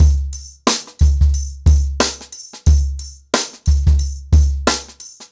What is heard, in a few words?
Nuclear 90BPM, ACOUSTIC DRUMS; The Roots Drumloops